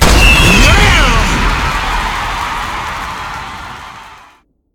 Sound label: big win text change